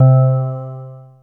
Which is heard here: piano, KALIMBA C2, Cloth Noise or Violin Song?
KALIMBA C2